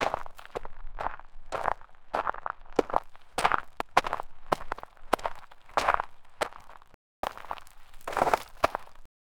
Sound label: post apocalyptic wastelan